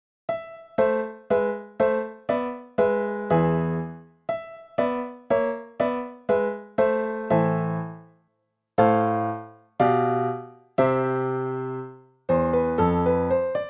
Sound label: variation piano; Anton Diabelli